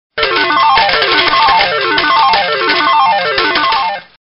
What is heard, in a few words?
slot machine ringtone